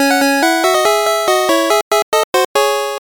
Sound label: gameover